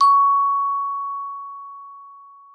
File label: C#4, GLOCKENSP S; Xsample VOL-4